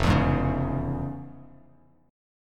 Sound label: F Minor Major 7th Sharp 5th, F, Bass Standard; strummed; JGuitar